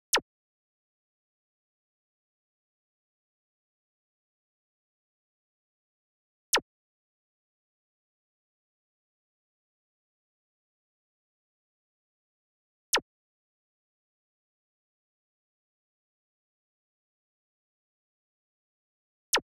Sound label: 150 BPM F# Min Laser, Future Bass; Melodies; Loop Stems; Cymatics; GHOST (Beta Pack); Believer